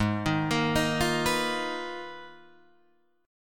Ab Minor 6th Add 9th, Guitar, DADGAD; down

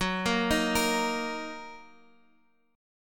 F sharp 6th F sharp down Guitar Drop D

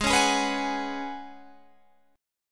Gb Suspended 2nd Suspended 4th Ab

